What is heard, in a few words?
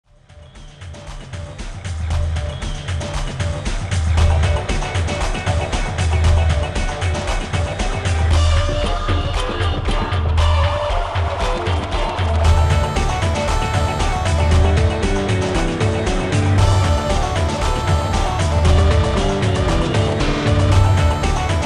Cornemuse techno sketch; J.S.Bach